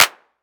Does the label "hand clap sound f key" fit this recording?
Yes